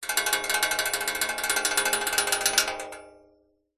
foley metal thin object med fast rattle clatter larger metal frame